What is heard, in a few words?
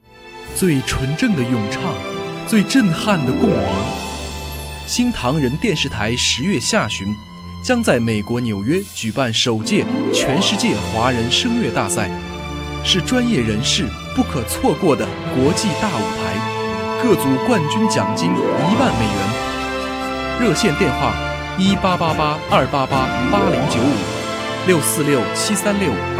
filler vocal